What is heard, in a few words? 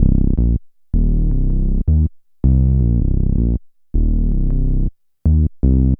Bass, Futurist Drum & Bass